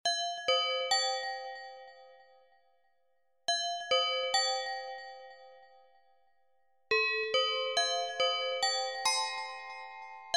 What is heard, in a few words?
Reveille; Qiku; Luna Youth; alarms